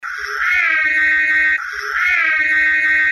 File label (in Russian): sirena; короткие; okhotnikov za privideniiami